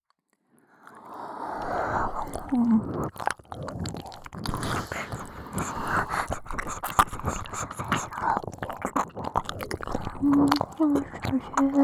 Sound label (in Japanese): 耳舐め囁き; 射精しろ×射精しろ